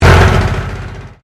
Convolution Pulse

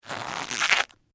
Rubber Glove, Stretch, Squeak; Foley; BlastWave

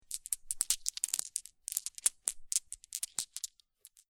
Marbles Handling Foley; Marbles or small pebbles moving in hand.; LYN099 package of Various Impacts; Miscellaneous Toy Sound Effects

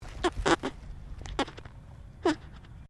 ANIMAL ALPACA BABY GRUMBLE; Alpacas on a farm in Gippsland Victoria; Miscellaneous Animal

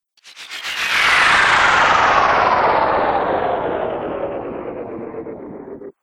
Alien Ufo Slow Down; Alien Sound Effects

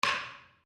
Wood On Wood

